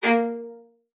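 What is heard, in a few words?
CELLO spiccato A#3; 90 s Sample CDs; Xsample VOL-5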